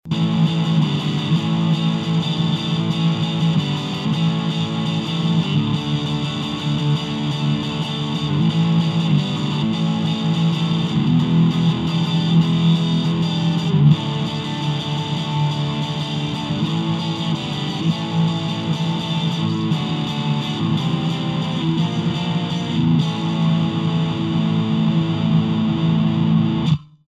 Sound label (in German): rode nt1 e gitarre distortion